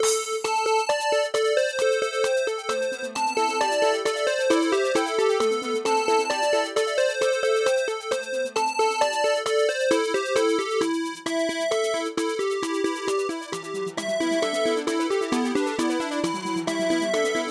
Yamaha MU5; System Shock

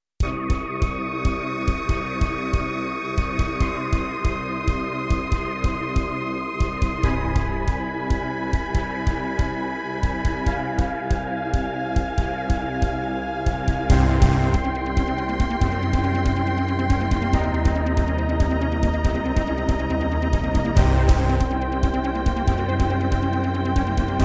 Miditemp DoX-1; sigil doom episode 5

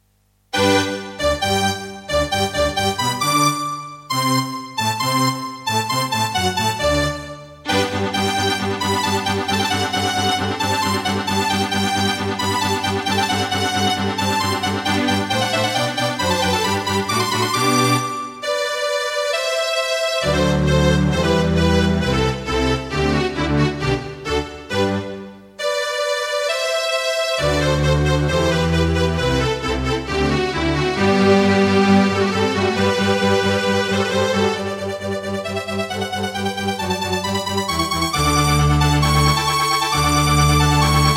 Casio CTK-2100; classical; Mozart; Eine Kleine Nachtmusik partial

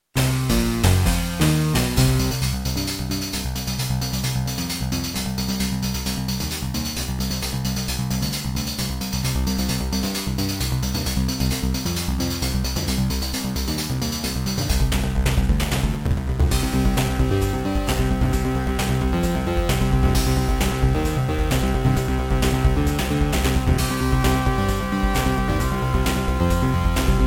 Beepblaster 2.0 SYNTHMODE; sigil doom episode 5

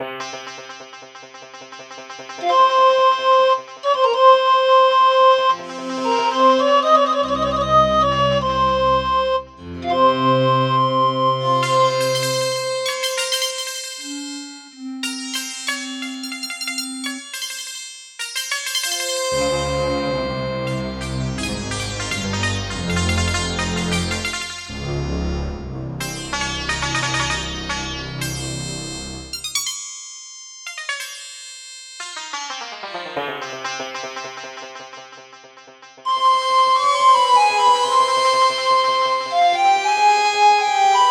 Yamaha DD-55; Prince of Persia 2 - Intro

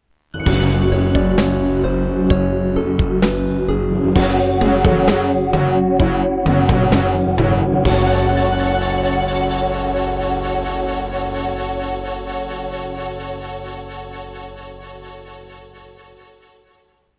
Introduction, Serdaco Qwave; Doom (Sigil)

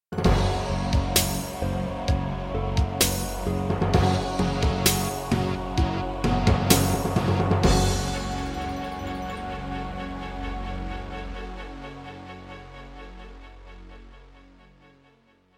Roland Edirol SD-20 GM Contemporary; sigil doom episode 5

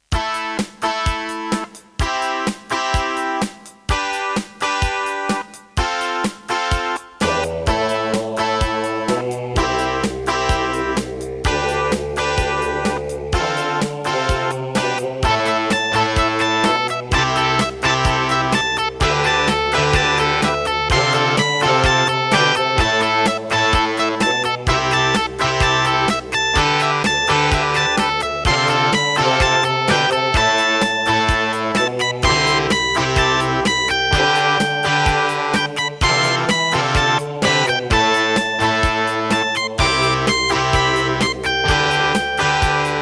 Roland SC-88 Pro 55MAP; raptor call of the shadows

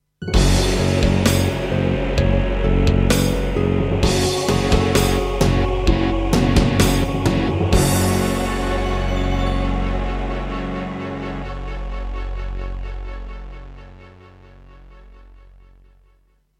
Roland SC-8850; sigil doom episode 5

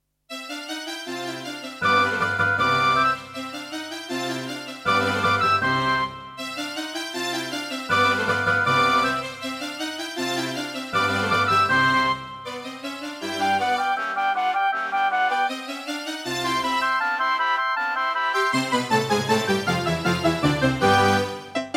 Yamaha TG100; classical; Mozart; Concerto K467 partial

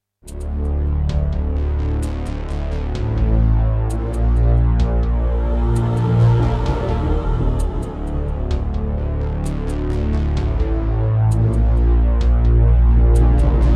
Roland SC-88; Descent II

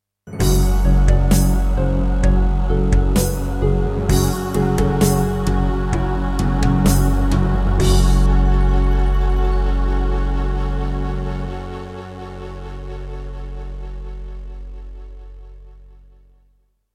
Introduction, Yamaha DTXpress; Doom (Sigil)

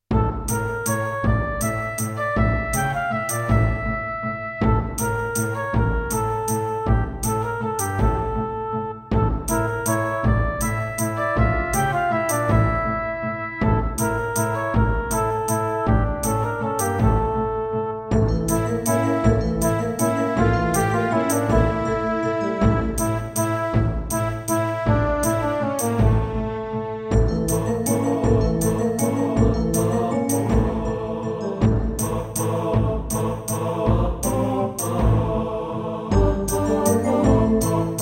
Boss Dr. Synth DS-330

